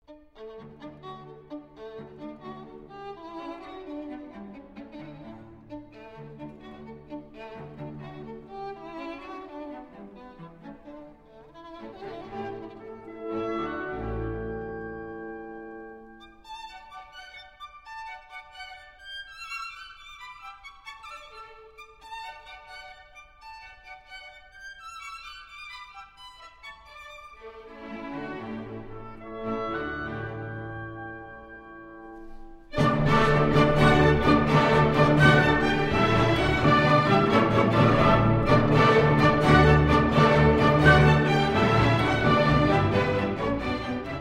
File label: Violin Concerto In D, Rondo (Allegro)